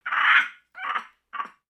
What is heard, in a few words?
Pets Skunk Idle Bored; creatures pets; Lego